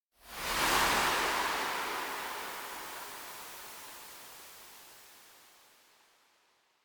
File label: elec screen whiten; anemoi